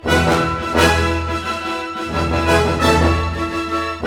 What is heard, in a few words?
HORSE, Western; East West; Scoring Tools; ORC